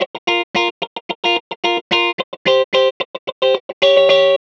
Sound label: funk guitar dry